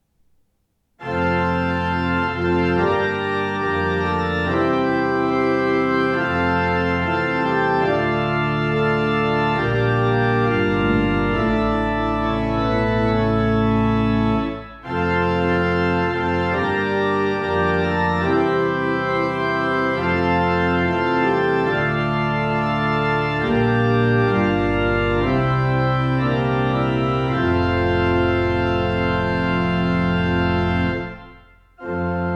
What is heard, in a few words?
Fanfare; Stromsgodset